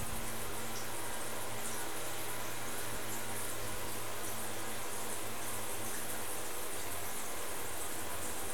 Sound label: Rain Mild; Weather